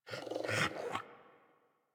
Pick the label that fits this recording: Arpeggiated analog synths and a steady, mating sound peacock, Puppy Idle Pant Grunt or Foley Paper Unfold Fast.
Puppy Idle Pant Grunt